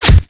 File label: impact flesh; Modern Warfare